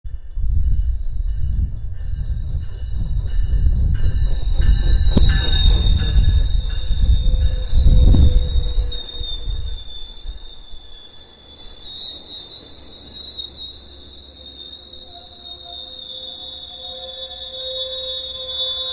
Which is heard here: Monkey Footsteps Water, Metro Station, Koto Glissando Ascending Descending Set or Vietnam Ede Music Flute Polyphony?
Metro Station